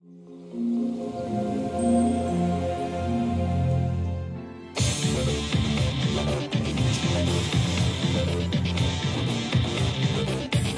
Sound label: music